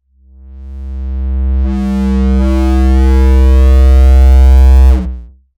Winding, Basses; AMG - Prototype Drum & Bass

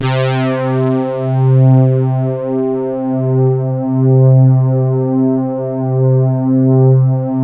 flat pad; Sound Gallery - Charged

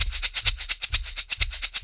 HOT PERC, Roots Disco; USB Soundscan vol.32; AKAI

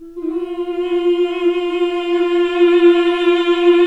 MMH F2, CLASSIC VOX

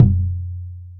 Deep Drum Wave; Planet Earth; Digital Sound Factory Planet Earth

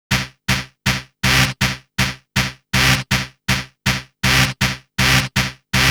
Dance Synths, Hardcore Loops, Synth Loops

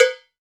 HAND BELL, Latin Hand Perc; E-MU Producer Series Vol. 2; More Studio Essentials; Composer

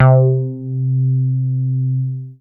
C3, BASS; Q Up Arts; Dream Experience

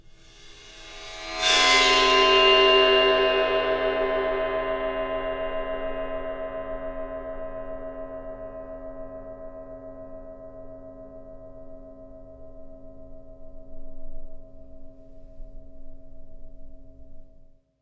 Void Perc; The Void; Processed Drumkits

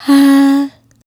Hah, Vocal Lix, Swingin' Single Notes

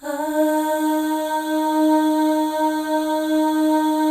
AHS, FEM; Spectrasonics; FEM.2 AHS

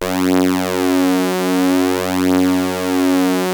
OB F#3, Rare Synthesizer; Hollywood Edge - Giorgio Moroder Rare Synthesizer Collection; OB 4 VOX.

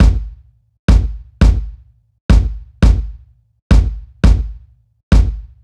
Kick, Kick Loops; KJ Sawka - Drum n Bass & Beyond; Drum Tool Box

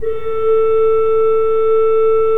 KOPPELFLUTE A3, Cathedral Organ; Propeller Island

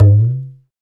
PRC CONGA, Roland; Northstar - Drumscapes Roland; Bonus Perc; Congas

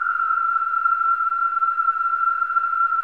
WHIS, whistling; Spectrasonics; Symphony of Voices

